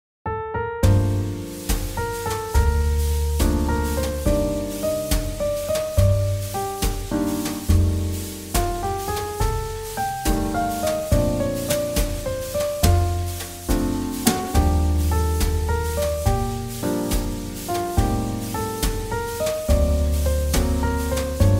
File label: JazzBallad; 잔잔